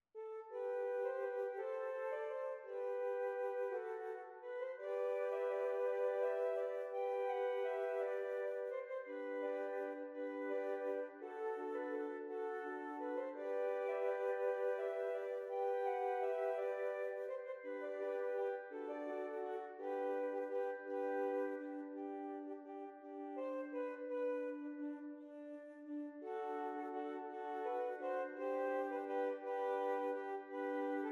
Scottish Trios

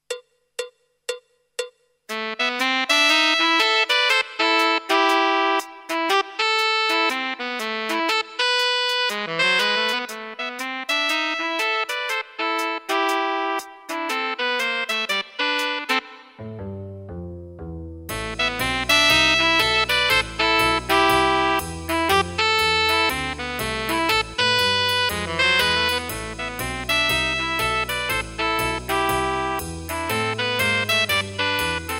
Two Sax

